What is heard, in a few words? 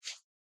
swoosh In